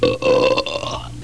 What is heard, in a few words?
burp